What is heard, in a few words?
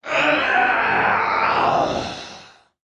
Death; Warframe; Leekter; Battle Barks; en